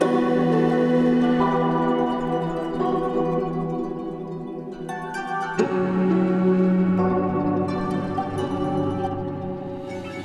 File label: 172 BPM B Min, RnB; Houston Dancing